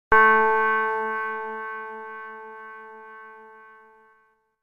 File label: Grandfather's Clock